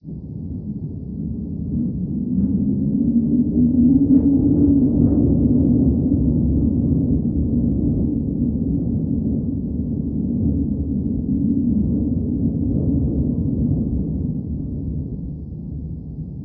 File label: tone alley, ambience